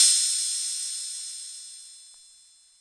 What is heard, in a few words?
Korg KPR-77, drum machine